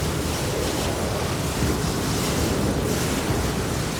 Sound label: summonerspell base ghost; LoL